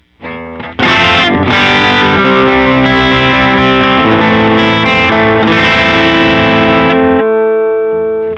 electric guitar Heavy Electric Guitar; Joe Chiccarelli

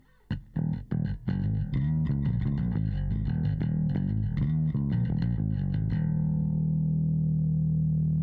bass Fast Metal Bass; Pulsar

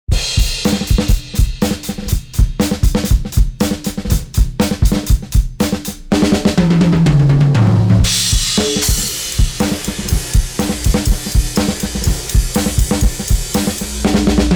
Acoustic

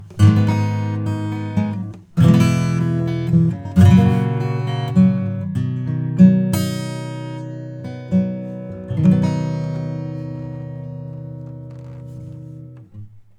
Transient Shaper; Neutron 4; Attack 6 Sustain 2 Sharp Balanced

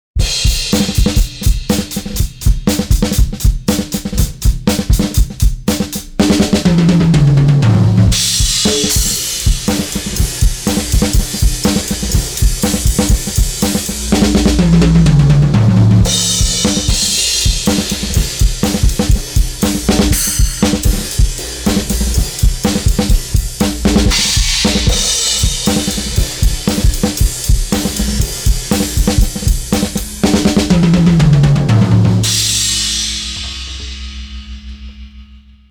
Lindell 6X-500 ANALOG off

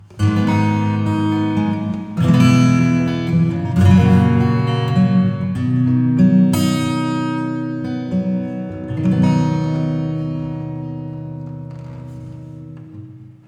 Reverb Acoustic; Reverberate3

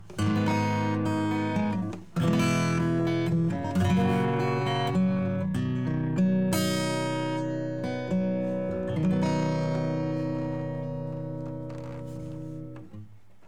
E Gtr

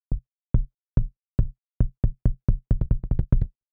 kick; SSL X Gate

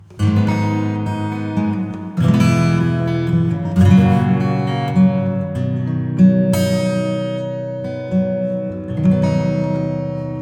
Reverb Compare Acoustic PLATE

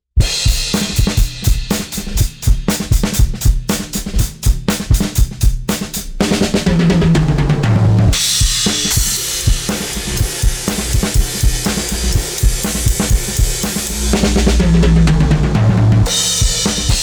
drum STEREO IMAGING; COSMOS

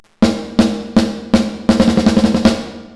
XenoVerb TONE 2975Hz

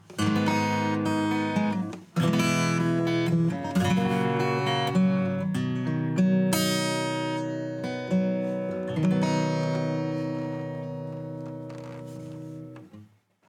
acoustic; AMEK

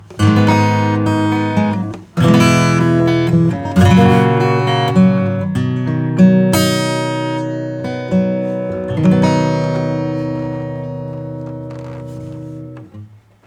acoustic comp eq

